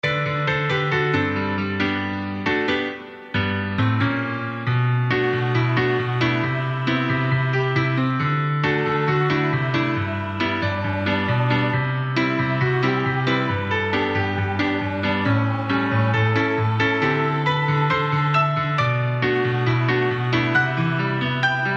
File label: piano short karaoke